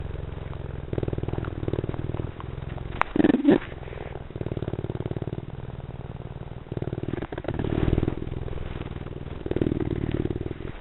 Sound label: purr